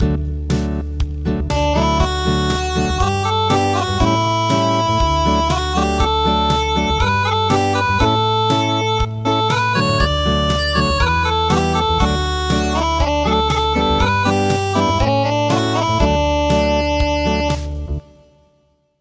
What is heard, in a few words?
Acoustic; Lullaby